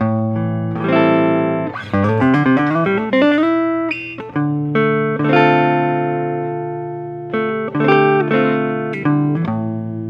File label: sadowsky model; natural